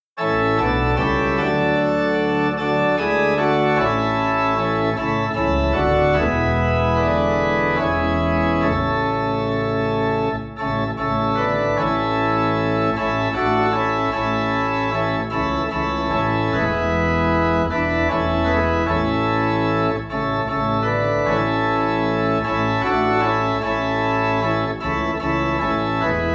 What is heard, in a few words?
Organ Low in A; The Love of God